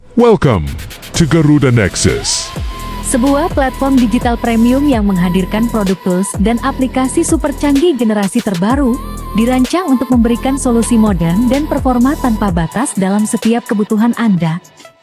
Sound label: ui music